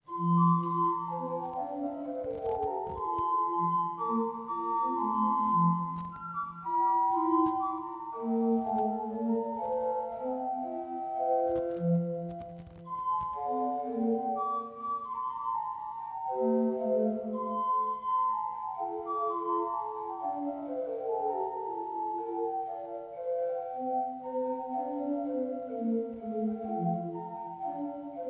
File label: flauta 4 II man